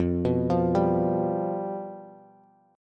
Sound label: F Suspended 2nd Flat 5th, Bass; arpeggiated; 13,14,15,12; JGuitar